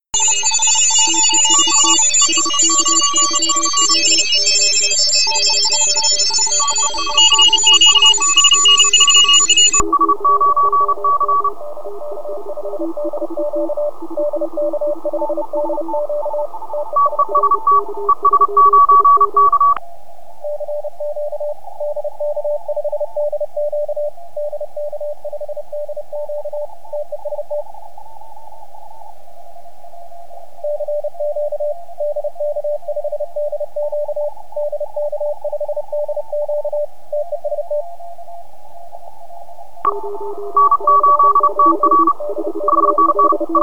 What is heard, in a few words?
cw filter